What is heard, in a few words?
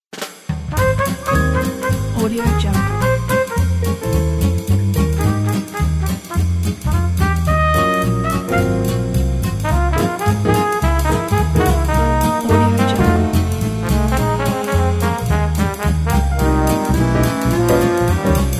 swing jazz